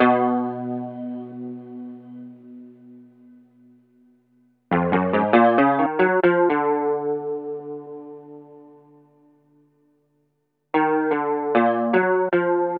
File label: Guitar, cinematic funk; Sonic Foundry (Sony Creative Software); Cinematic Funk Urban Tone Poems